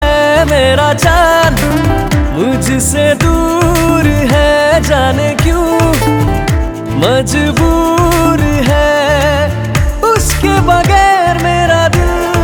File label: Ringtone